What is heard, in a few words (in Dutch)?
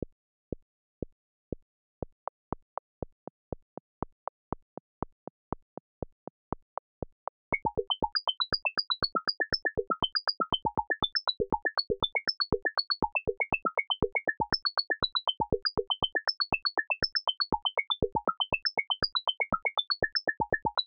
ritme